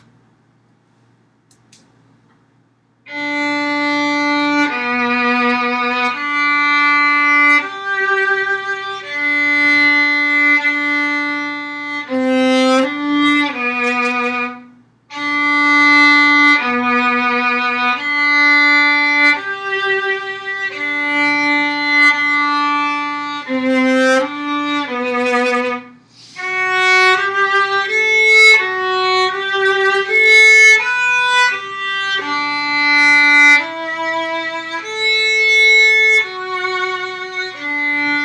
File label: Deck the Halls viola part